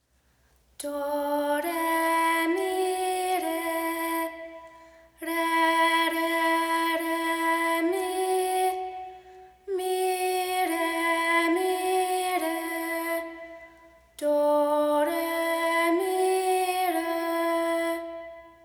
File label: do re mi re